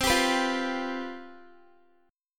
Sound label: C Major 7th Suspended 4th, down, Guitar; CM7sus4 strummed